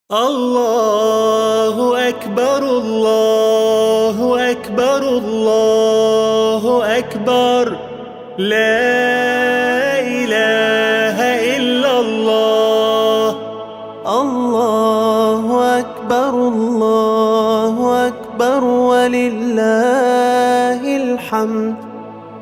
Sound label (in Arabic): تكبيرات عيد الاضحى; بصوت ابرز منشدين العالم